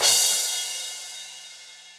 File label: Crash 16 Cop Style, Cymbal; Drums; Drum Hits; Standard Hits; Session Drums Studio